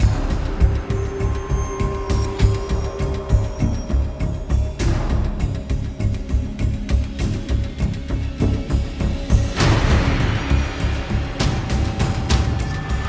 Waves Common Battle Light Battle; Skyforge

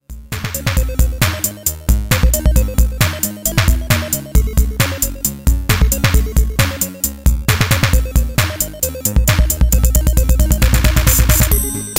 8bit dungeon boss; 8 bit video game ringtones; Android game music

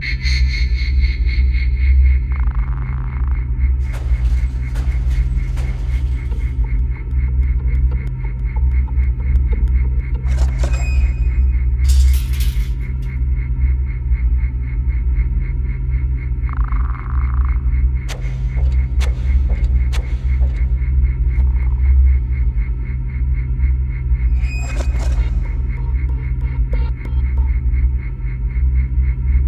Ambient The Factory; rayman arena

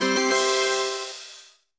Combo Bonus; 10 Talismans; windows gamerip 2006

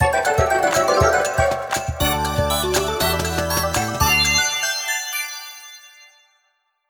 Picross Completed; Clip Picross Completed; Kemono Friends Picross

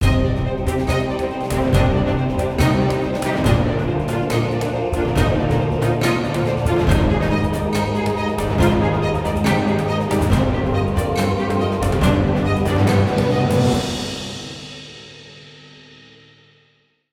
Battle Drums; Necropolis

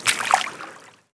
warrior run water

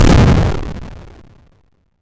Molotov; Gloom

